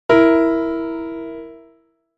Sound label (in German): Intervalle simultan